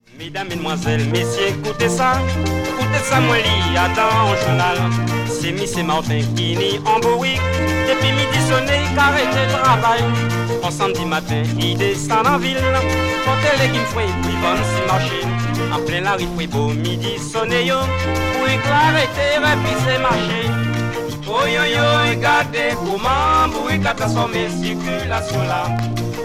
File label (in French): musique calypso